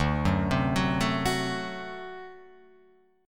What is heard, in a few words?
D 7th, down, Guitar, Open F; D7 arpeggiated; JGuitar